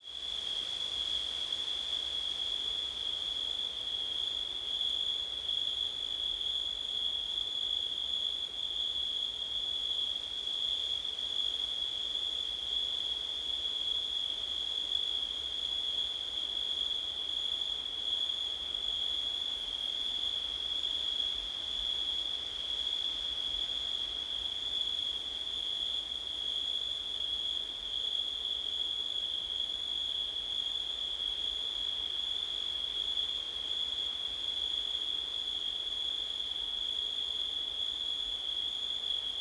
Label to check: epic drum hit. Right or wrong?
Wrong